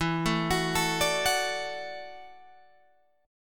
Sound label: E 7th Suspended 2nd Suspended 4th, down, Guitar, Open G